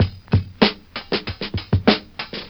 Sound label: shill, Drum loops Boombap